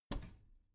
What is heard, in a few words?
bathroom cabinet door open heavy